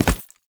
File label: Step Default, Foley, Footsteps